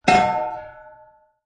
Big Old Bell Single; Musical; SFXsource

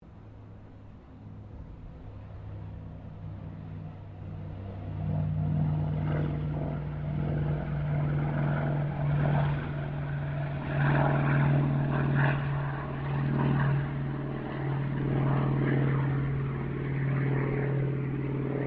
AIRCRAFT TROJAN SQUADRON 4 PASS DISTANT; Mixed aircraft squadrons; Airplanes; Vehicles

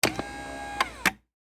Servo Motor Short, Hospital, Bed; Motor Sound Effects; BlastWave

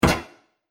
Single Dramatic Hit, impact; Miscellaneous Impact Sound Effects; Andrew Fleming

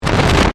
Distortion Wipe; Blast Wave

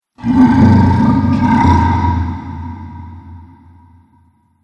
Zombie Corrupt, Zomby Sound Effects; horror

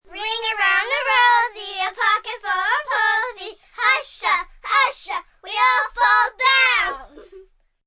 Singing, Singing, children, song; RingRosy; VoiceBox

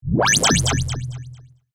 Pulse Ray, Scifi; Battle (Sound Effects:Science Fiction); BlastWave

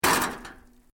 METAL LIGHT CRASH; Materials

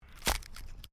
WOOD PLANK TWIST

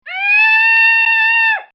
Meadow Lark

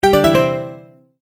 multimedia tone alert

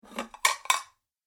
Medium Side Plates Slide On Table Then Move Apart

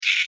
Beetle, Hiss, Vocalization, Insect, Bug; Beetles; Sound Effects:Animals:Insects; Blastwave

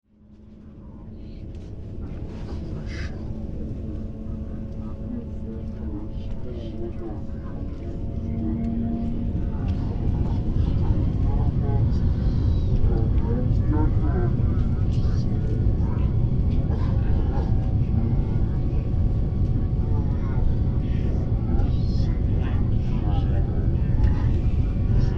Airliner Take Off Interior; Airplanes; Vehicles; Tom Hutchings

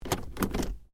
Hyundai Accent Auto Gear Shift; Hyundai hire car; Accent 20 08; Cars; Vehicles

